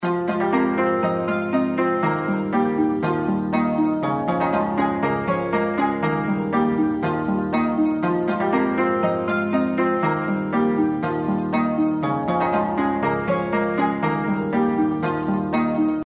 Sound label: outro bgm; Four Beauties